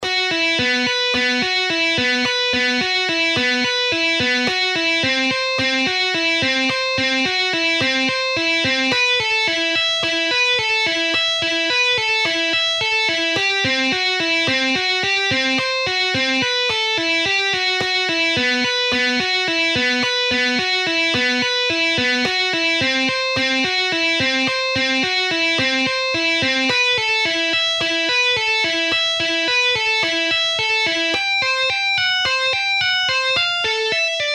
Guitar Dynamics Half Speed

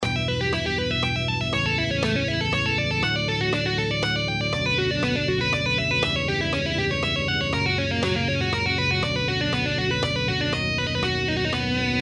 String Skipping

